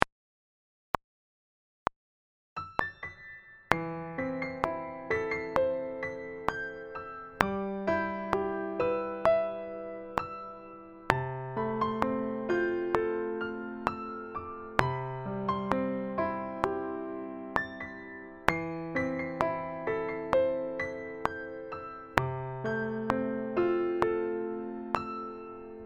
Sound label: melody